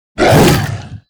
Shoveler attack; kubera; KuberaMine

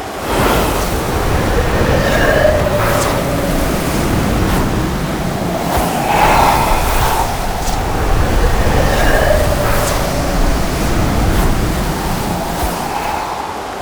Ranged Whirlwind

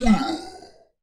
Gargoyle death; Temple; Arenill

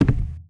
cursed temple move

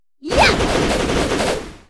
attack; Sapi

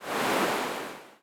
Liquimon move; SwampOfDarkness

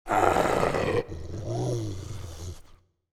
wolf human move

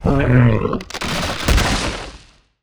Death; Naipin

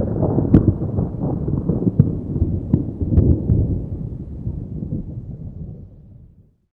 fail; Bosswar

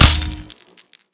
Amoro Ball Knock; Ferea

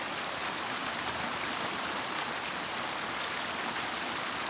waterfall; Kethotum

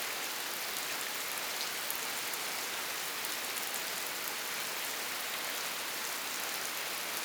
Rain; SwampOfDarkness

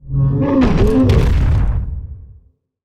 death; RaisedGladiator

